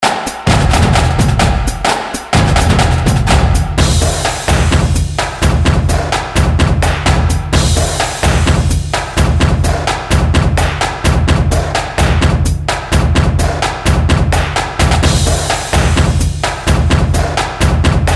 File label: Drum Cadence